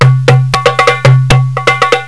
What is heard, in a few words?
dumalum